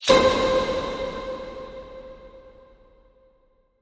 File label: Pluck